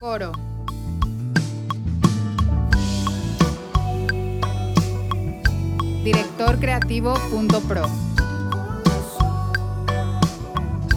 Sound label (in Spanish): D, 88, 4/4